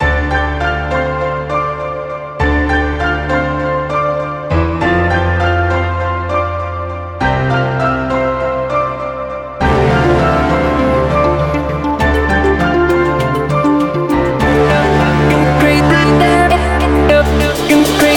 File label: Falling raindrops ringtone